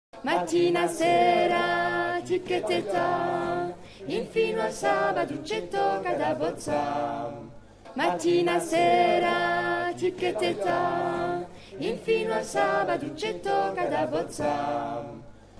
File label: Alto